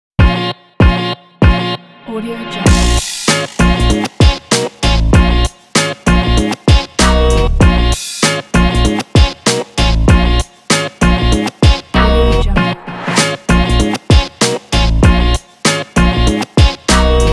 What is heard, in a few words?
hiphop